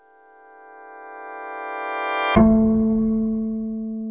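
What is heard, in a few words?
rev comb, piano; AKAI S-Series CD-ROM Sound Library VOL-2; PF MIX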